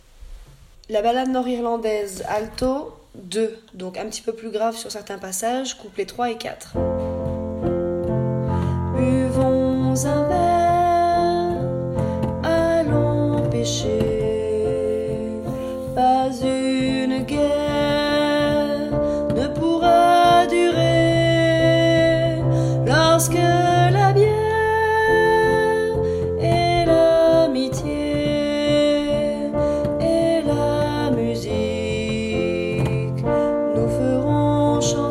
Alto 2 grave; La Balade Couplet 3 Et 4

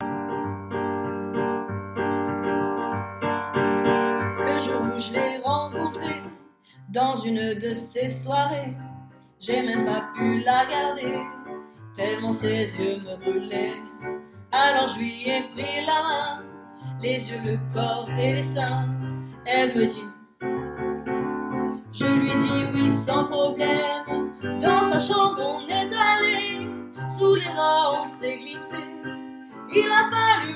Basse partie; pupitre basses; enregistrements de travail

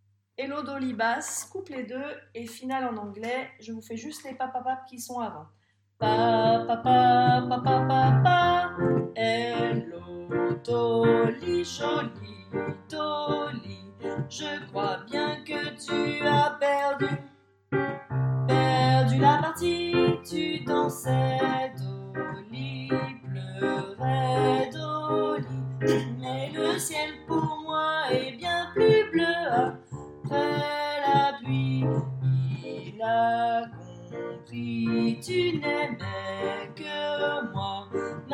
basse couplet anglais; pupitre basses; enregistrements de travail

